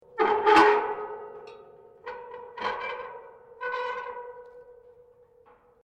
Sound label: METAL CREAK LARGE; Materials